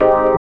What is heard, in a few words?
E. PIANO, KEYS